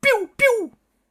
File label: laser guns